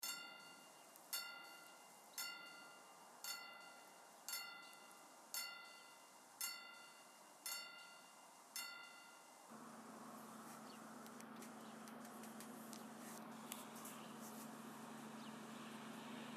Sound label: train sound